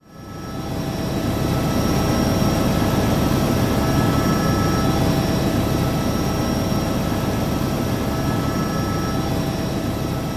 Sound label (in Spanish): helicoptero